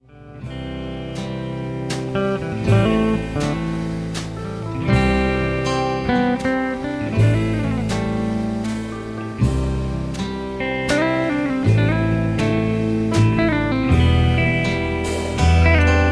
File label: Waltz